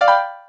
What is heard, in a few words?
Alarm Xtra; Samsung SGH-i600